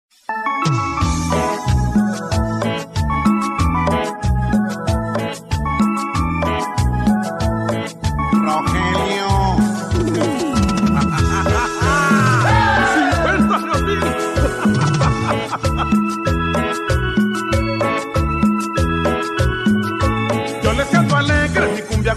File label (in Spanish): CUMBIA